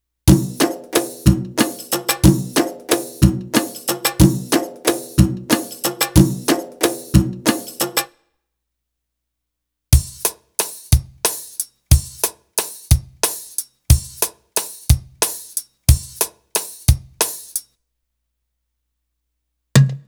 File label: Arabic Rhythms